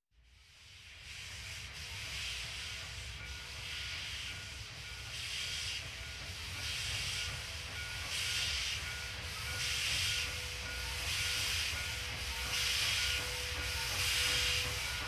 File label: Walking Machinery Trucks